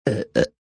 Burp Double, Burp, high pitch, twice, (human voice); Burping